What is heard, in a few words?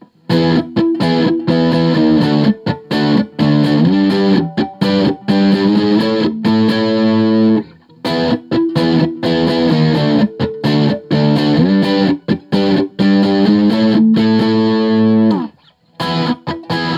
Guild S300; JCM 800; A-Barre; Axe-FX Ultra; QSC K12 speaker